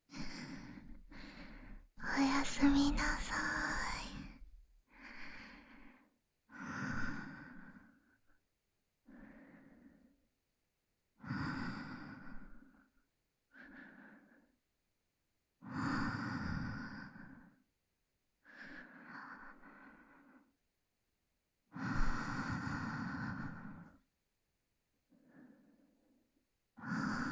寝息（左）鼻息

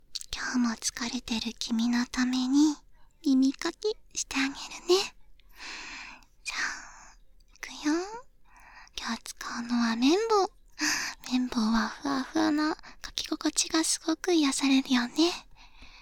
耳かきパート

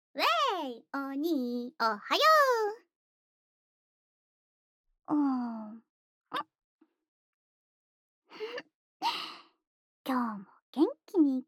おはようのチュー; ASMR

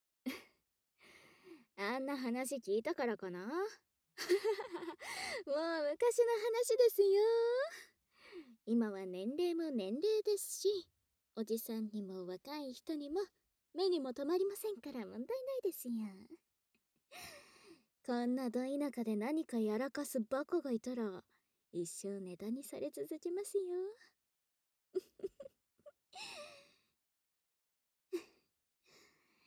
んふふ 声のみ

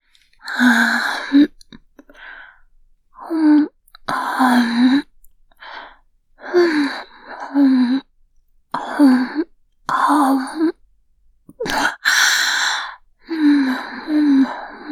はむはむ, ASMR; キス

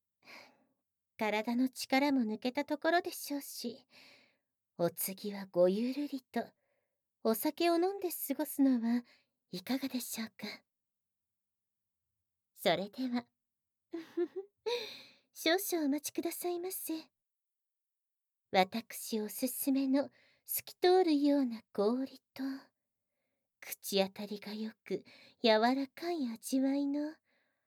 氷ASMR -氷の音と晩酌-（効果音大きめ）, バイノーラルマイク

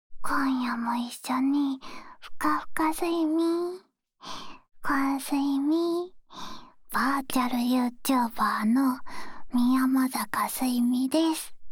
寝息; おやすいみ～……一緒に寝落ちしよ？

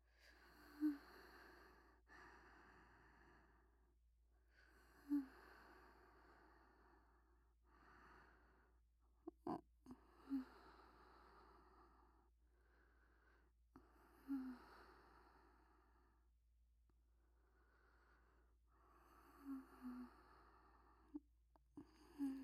僕の寝息; 僕とお姉さんの音声; ループ音声集

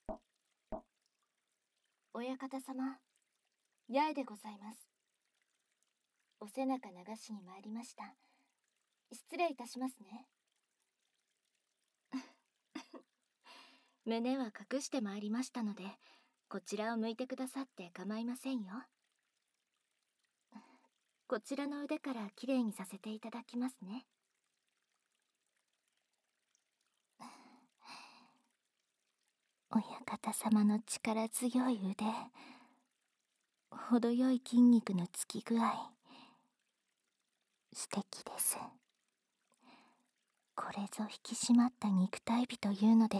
温泉でのんびり癒やし; 温泉でのんびり癒やしタイム

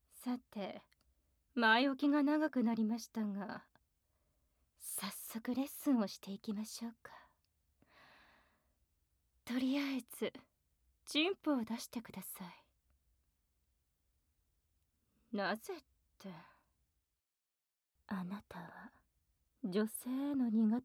左右同時耳舐め手コキ

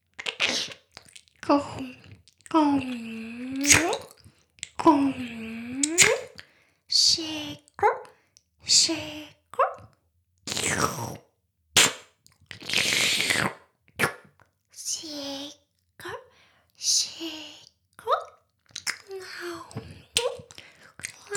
シコシコ音声; 魔法少女マスカリマッスル

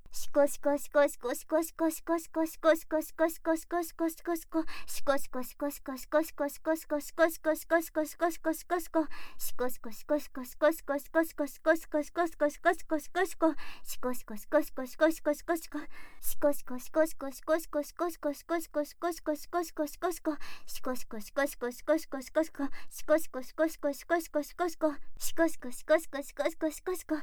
シコシコ音声

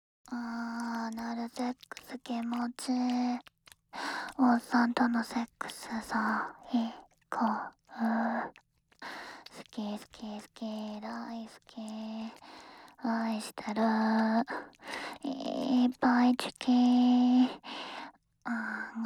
オナサポボイスとゲップ責め

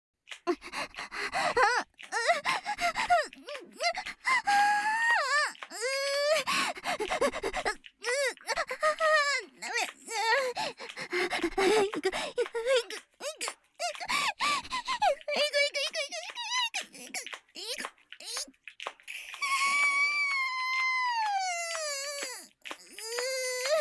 絶頂（SEあり）高潮

